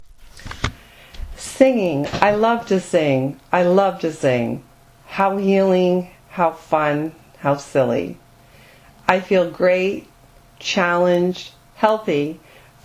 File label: Singing